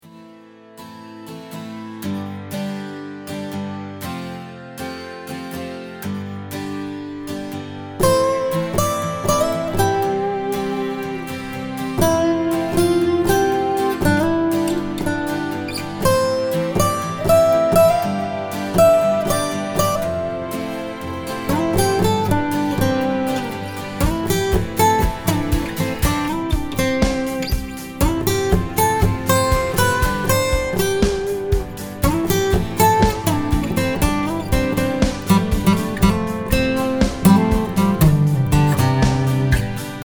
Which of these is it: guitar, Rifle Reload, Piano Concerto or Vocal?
guitar